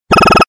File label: very short sms